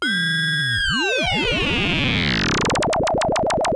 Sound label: Moog A2; Hollywood Edge; Giorgio Moroder Rare Synthesizer Collection; MLTI.MOOG